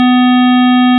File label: blok 250Hz